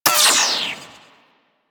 Arch Cannon Mech, Weapons; Warframe; Archwing

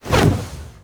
Wall Latch End; Warframe; Tenno

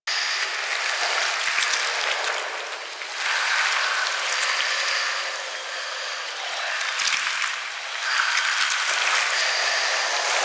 Black Goo Ornament Loop

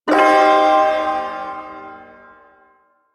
Shawzin Pent Min Chord; Warframe; Duviri Ersatz Shawzin

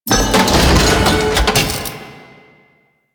Thumper Part Pickup; Grineer Forest; Warframe; Veilbreaker; Kahl

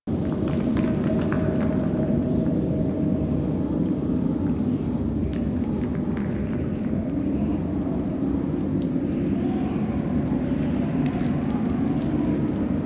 Outpost Cave Amb; Warframe; Ambience; Corpus; Crp Outpost Defense Three

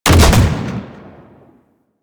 Fire Shot; Weapons; Seer; Warframe

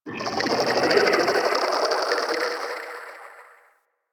Tendril Tree Creaks; Ambience; Props; Warframe; Infested Eris

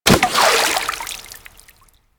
Fishing Spear Water Impact; Warframe; Weapons; Eidolon Fishing Spears

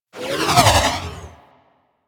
Ball Projectile By; Warframe; Missions; Tenno Ball

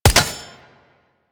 Fishing Spear Damage Hit; Warframe; Venus Fishing Spears

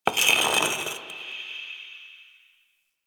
Stone Small Scratches; Ambience

